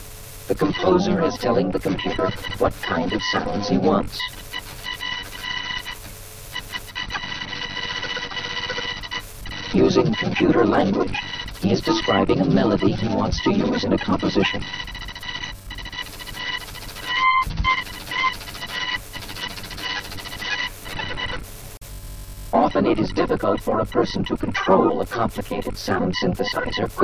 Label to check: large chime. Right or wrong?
Wrong